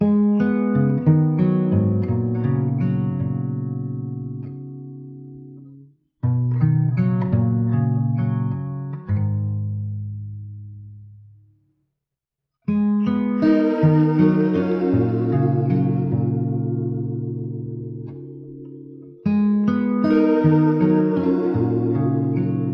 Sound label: guitar